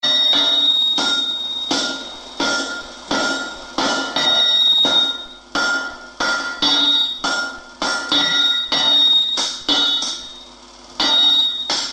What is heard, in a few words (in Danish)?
Smed i arbejde; Kroppedal Museum; Alpegården